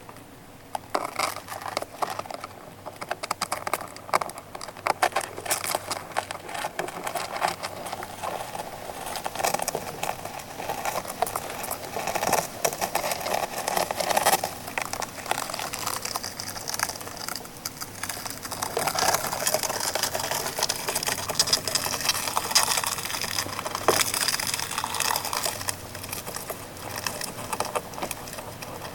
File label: velvet red ant, the recording is of the insect crawling around